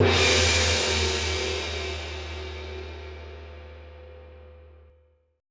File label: GONG CRSH, TIBET; Best Service Advanced Media Trax Modern Composer; ETH TIBET